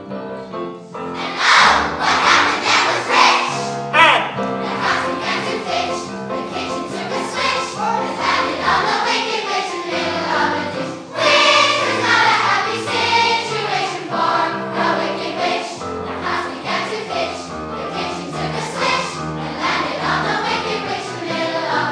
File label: singing rehearsal; for wizard of oz